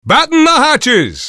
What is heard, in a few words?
attack; kunkka